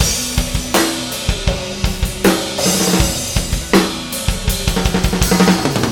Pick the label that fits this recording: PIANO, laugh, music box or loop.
loop